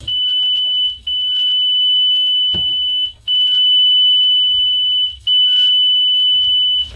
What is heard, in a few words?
repetitive car seatbelt warning chime